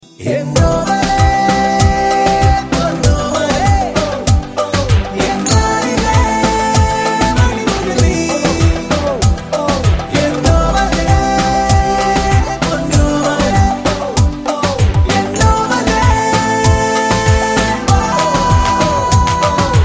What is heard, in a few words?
awesome spanish, ringtones, latino